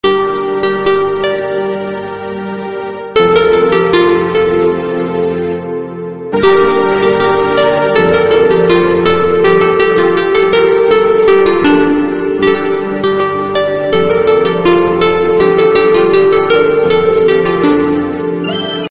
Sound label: musical guitar ringtone; sms ringtones